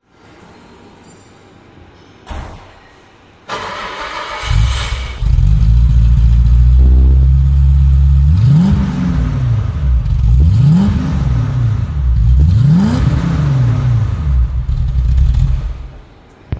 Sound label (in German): mercedes benz 107